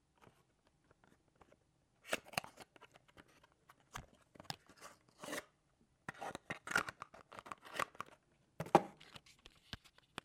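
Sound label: box opening sound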